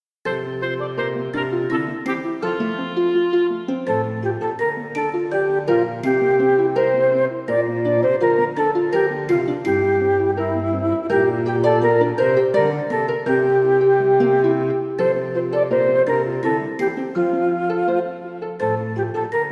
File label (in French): Petite souris bande-son melodie